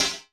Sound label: Perc Reso, Vermona Mono15 Drums